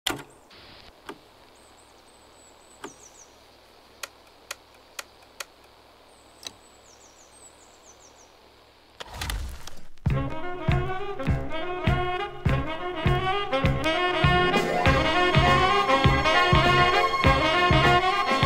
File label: Estonian Disco, Funk & Jazz